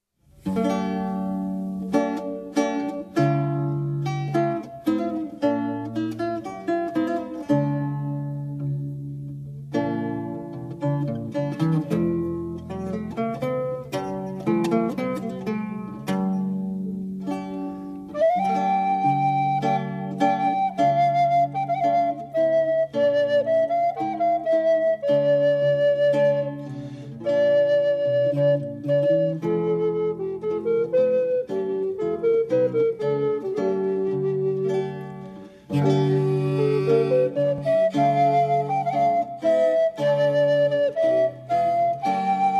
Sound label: Ukrainian baroque music